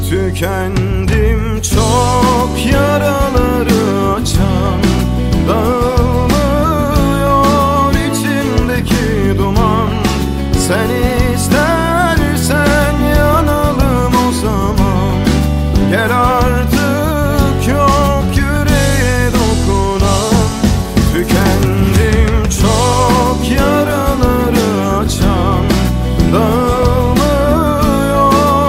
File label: Mobile ringtone